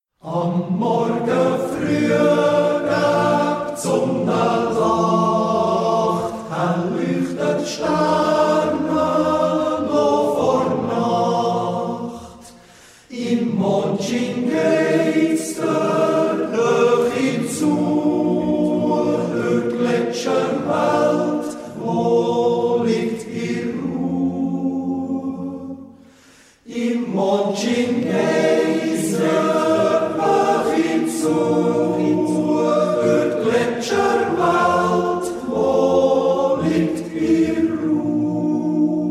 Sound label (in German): Chor